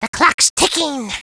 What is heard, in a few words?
Clocks Ticking; The clock's ticking; Gruntz; Time Bombz